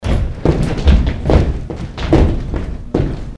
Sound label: dance group